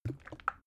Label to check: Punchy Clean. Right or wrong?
Wrong